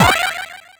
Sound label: pogo jump; ducktales remastered